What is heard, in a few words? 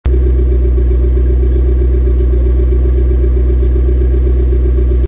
3200GT, maserati